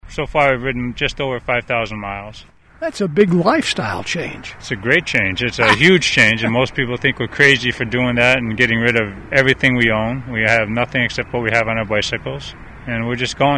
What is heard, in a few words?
Bike